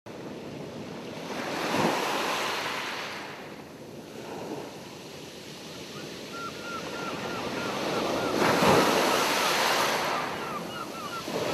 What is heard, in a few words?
ocean seagulls